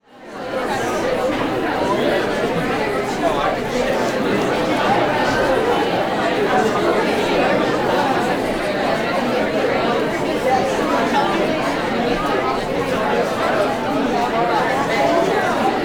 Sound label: School Busy Student Crowd; crowd walla; Sound Ideas; Walla Series